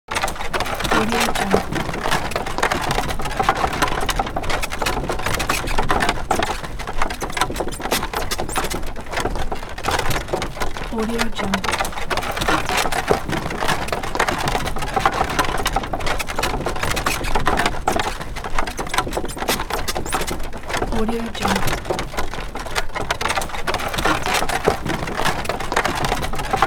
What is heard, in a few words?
horse drawn carriage wheels grind on mud and stone; hollywoodedge